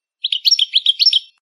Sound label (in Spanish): llamada de paillo; campero